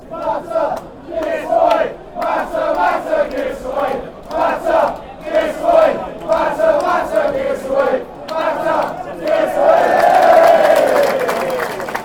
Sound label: give us wave, fanchants; mata